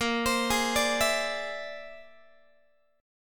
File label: Bb 9th Flat 5th Bb down Guitar 4A,4E,5A,5D,5G sharp,6C sharp; arpeggiated; JGuitar